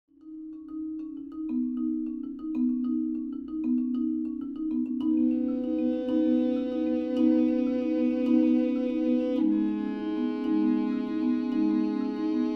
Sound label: Rhythm